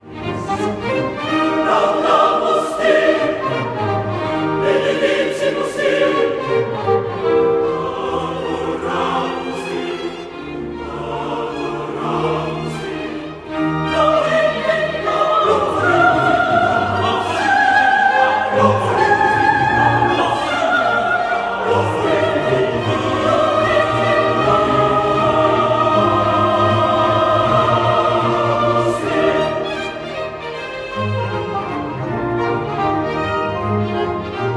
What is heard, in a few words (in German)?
Gloria Große Orgelsolomesse